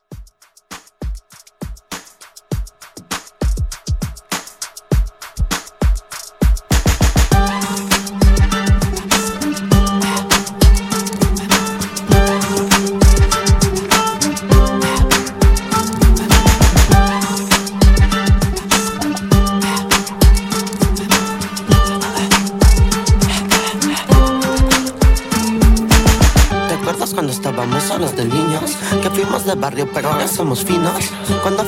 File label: Hiphop Re Drum 100